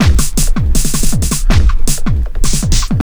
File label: JUNGL 160, JUNGLE LOOP